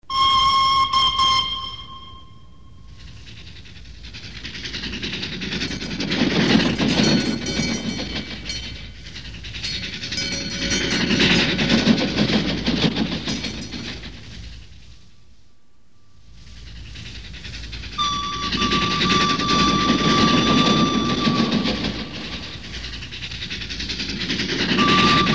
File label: Train Sound Effects